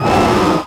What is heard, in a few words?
pinky pain, monsters vocalizations; DoomRTX